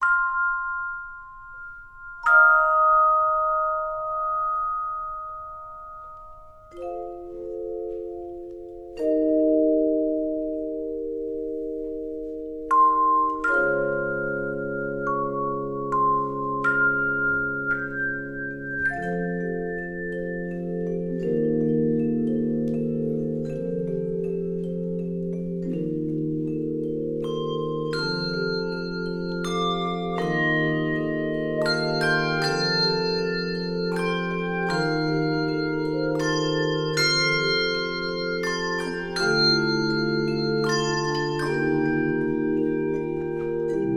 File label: Handbells 2-3 Octave